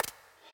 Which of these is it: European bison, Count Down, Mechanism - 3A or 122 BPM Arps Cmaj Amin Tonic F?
Count Down